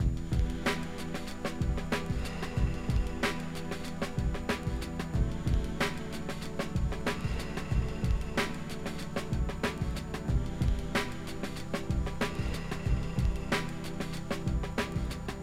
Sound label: End Melody